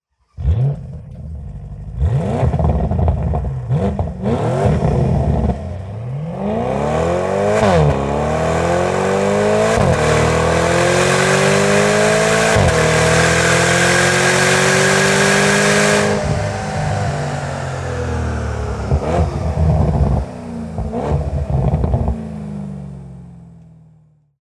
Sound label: Evolution Porsche Panamera Turbo 971, exhaust